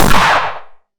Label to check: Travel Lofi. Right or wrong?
Wrong